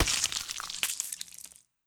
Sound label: Impact Gore